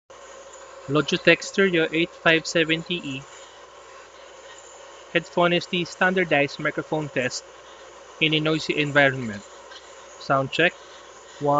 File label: loud; logitech h570e